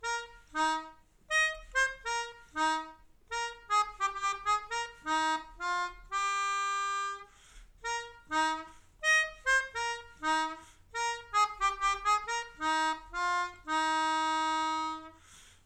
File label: Melodica